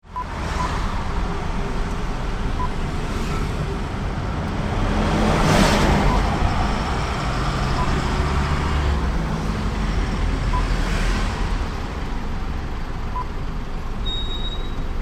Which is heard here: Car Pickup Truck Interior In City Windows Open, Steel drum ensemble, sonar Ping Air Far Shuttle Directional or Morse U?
Car Pickup Truck Interior In City Windows Open